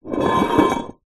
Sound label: household cup metal camping slide wood counter; zapsplat